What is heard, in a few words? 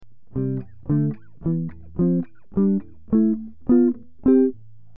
power, guitar chords; Two Part Harmony/One Guitar